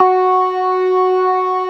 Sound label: TARK, organ combos; Keith Emerson Signature Series